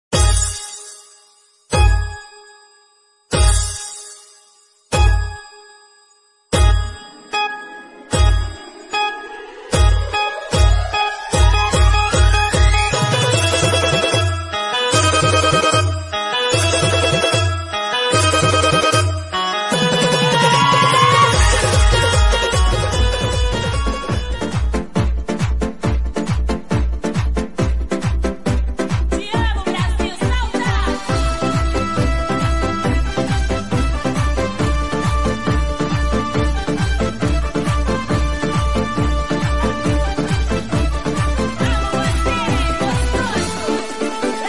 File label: Holi Song Ringtones